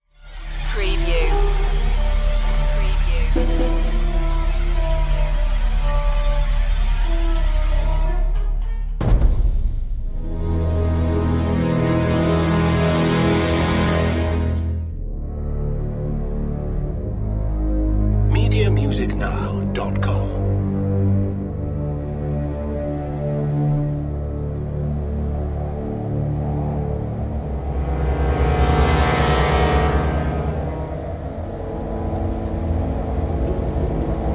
all hale your jester no drums, Orchestral Soundtrack Suspense / Tension